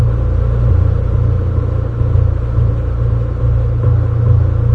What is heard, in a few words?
fan pulse drone